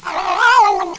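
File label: cat attack, cat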